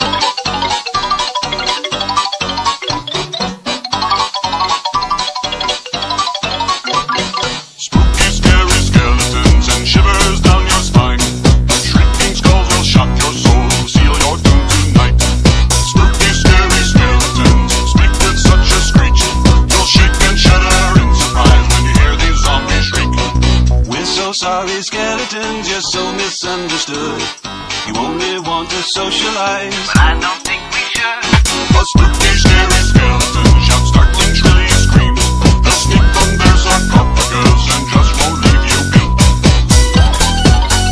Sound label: skeletons music